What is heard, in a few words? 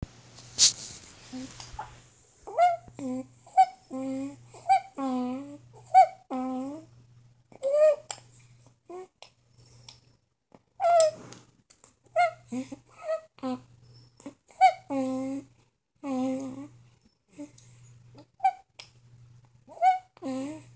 eating, I recorded myself eating dinner